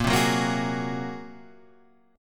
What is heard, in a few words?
A sharp 7th Suspended 2nd Sharp 5th